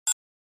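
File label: Flash Beep Electronic Tap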